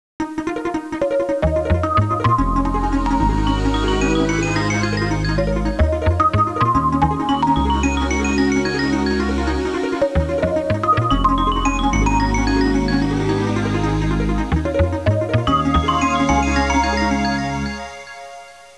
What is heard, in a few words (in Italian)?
musica elettronica; electronic